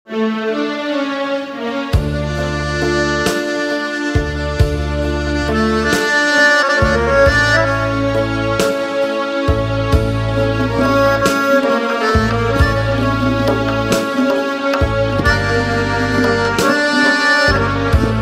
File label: Accordion